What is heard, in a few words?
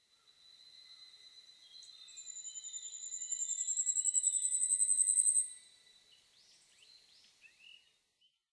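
yabusame, Asian stubtail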